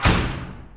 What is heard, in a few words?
stamp